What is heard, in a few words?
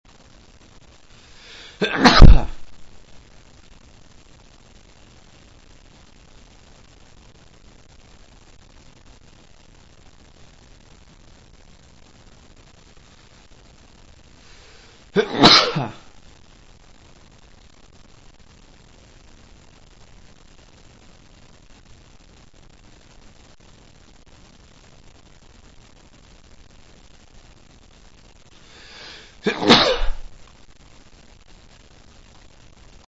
3 sneezes, male